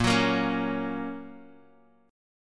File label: A sharp Minor Sharp 5th, down, Guitar, Drop D